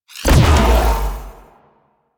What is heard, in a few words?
Companion Stomp Burst; Warframe; Pets; Zanuka; Abilities